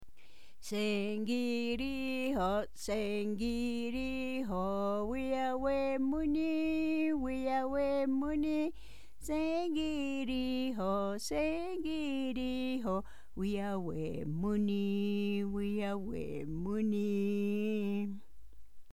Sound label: Good bye Songs